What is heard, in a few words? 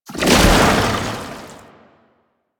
Tank Infested Melee Charge; Enemies; Abilities; Warframe; Lasrian